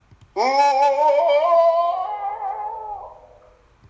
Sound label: dropping into well; Jeremiah